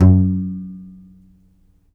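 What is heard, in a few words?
contrabass balalaika, Plucked Strings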